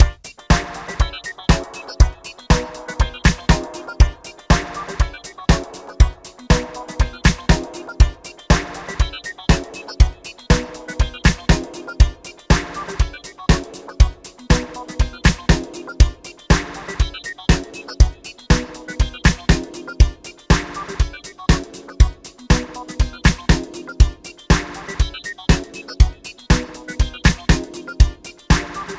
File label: Disco